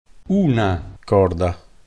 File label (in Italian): Una corda